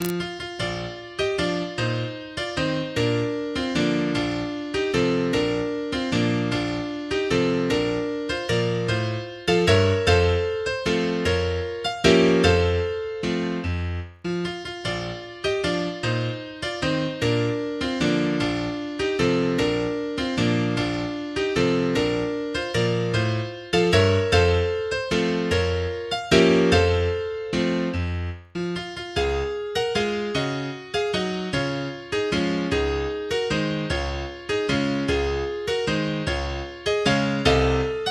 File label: waltz